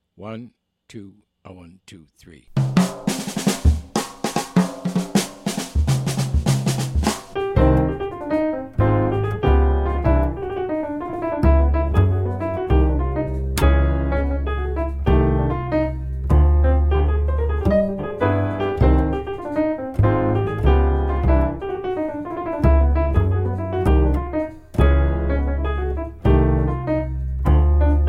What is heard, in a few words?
minus Drums